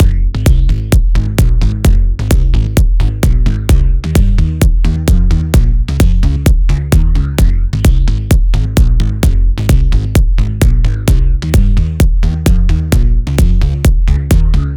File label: Phat Bass Line Kick Fm 130 Bpm; Axel Wayn